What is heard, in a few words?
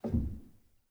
rel, Grand Piano; bitKlavier Grand; Piano Bar